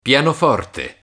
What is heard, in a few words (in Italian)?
pianoforte